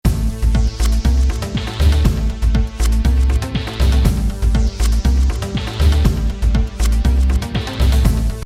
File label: Roland MC909 ambient